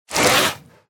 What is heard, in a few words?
Spider Spitter Scream Ending Combined; Deep Rock Galactic Boardgame